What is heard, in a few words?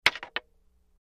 Wood Staff Hits Multi